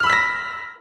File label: scare